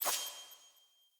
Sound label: Player Short Sword Perfect Wind Up; Weapons; Swords